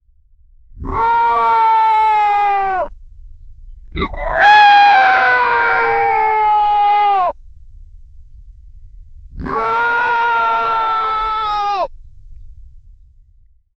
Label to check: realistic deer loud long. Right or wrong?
Right